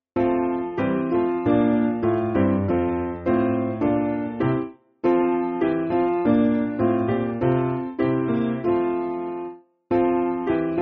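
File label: Piano, Music Backing Files for Churches; Saltash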